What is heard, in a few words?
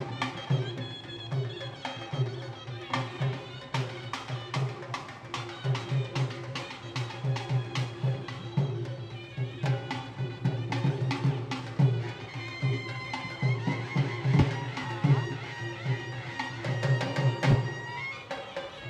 Dhol Softer